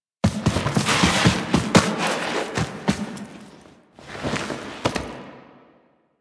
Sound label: Foley Run; Drifter